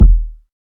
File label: puffy kick one shot d sharp key; kick drum